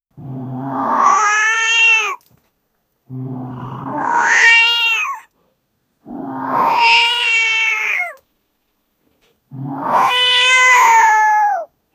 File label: cat scream heroic; meinkun